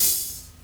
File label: HIHAT HIP; Best Service Dance Mega Drums